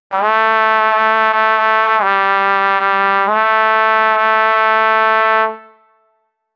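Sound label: a strong fast trumpet tone; brass instrument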